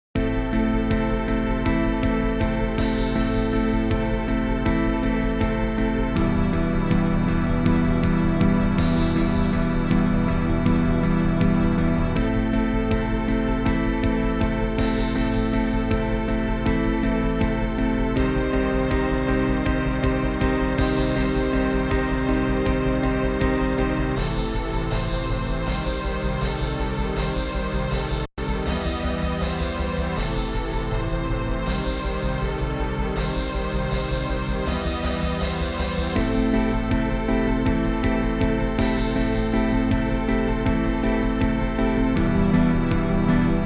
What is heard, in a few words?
spooky